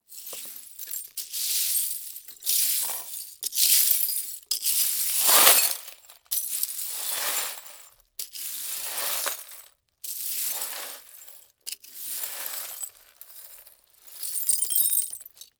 Household Glass Debris Sweep Up

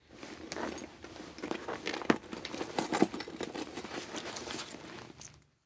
Purse Movements, Household

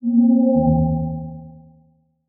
Title Transition Blurp; Adobe; Production Element

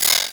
Multimedia Internet CD-Rom Flash Beep Multiple

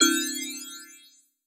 Multimedia Internet CD-Rom Flash Beep Low Pitch

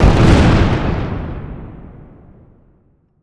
Explosion Large Deep; Fire and Explosions

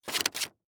Scissors Cut Paper Single Cut, Household

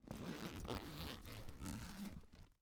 Sports Bag Duffle Bag Zip Up

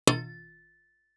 Impact Street Sign Metal Hit By Medium Metal Pole

